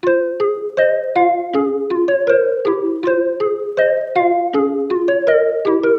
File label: Melody Loop - 160 BPM F Min; Cymatics; Oracle Sample Pack